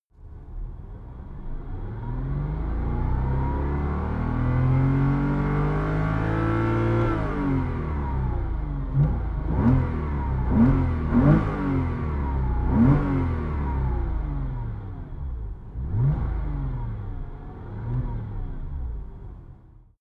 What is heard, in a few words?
sports car porsche boxster rev long interior; verkehr transport; autos